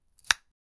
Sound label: picking strawberry from b